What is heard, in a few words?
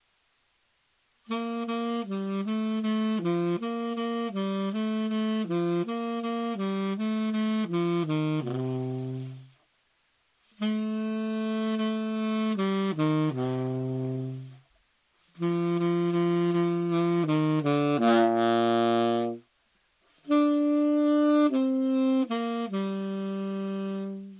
tenor